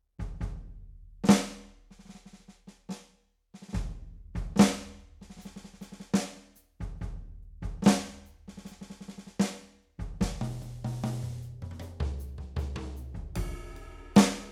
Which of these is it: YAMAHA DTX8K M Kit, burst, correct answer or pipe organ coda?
YAMAHA DTX8K M Kit